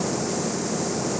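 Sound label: arc Welder loop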